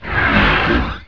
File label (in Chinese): 大事过度音乐